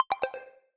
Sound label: connected